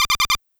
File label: alarm loop